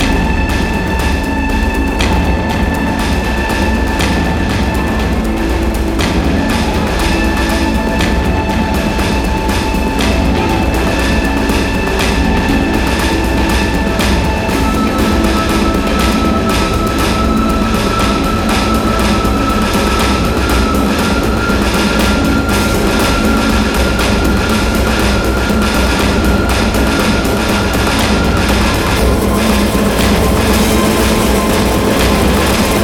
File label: Vent Chase Loop; Silent Hill Downpour